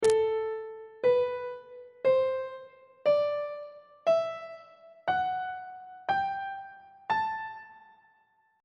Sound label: a dorian mode; treble clef